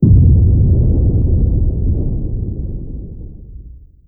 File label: explosion distant; SpaceshipDemo